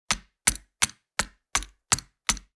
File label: Metal miniature game board map moving